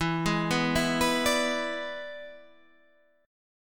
E 7th E down

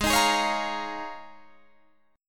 Eb 7th Suspended 2nd Suspended 4th Ab

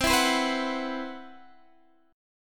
C Major 7th Flat 5th C, Guitar DADGAD

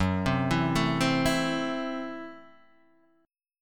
Gb Minor 7th Flat 5th Gb Guitar DADGAD

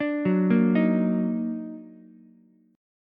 D sharp Minor Major 7th, Ukulele; arpeggiated

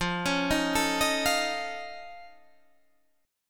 F Augmented 7th F, chord; F+7 arpeggiated; 13,16,13,14,16,13

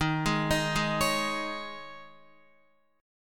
Eb 7th Suspended 4th, Guitar; Eb7sus4; arpeggiated; JGuitar

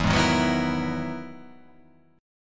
E-Major Sharp 11th E

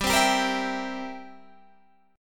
G 6th Suspended 4th Sharp 9th; G6sus4#9 strummed

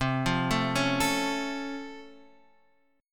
C sharp Augmented Major 7th, C

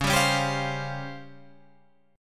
C sharp Major 7th Flat 5th, chord

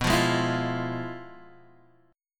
F Minor Major 7th Flat 5th, B

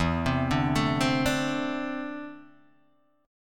E 7th Suspended 4th Sharp 5th, Guitar, DADGAD

